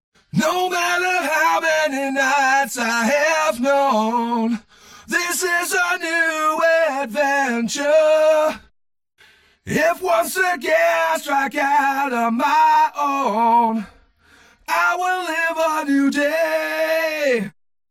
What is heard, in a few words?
doubler solo